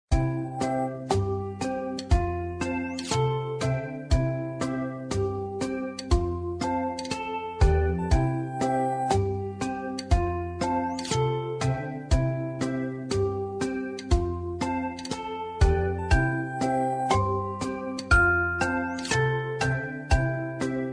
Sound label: bgm